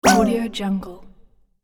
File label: cute character hit yelp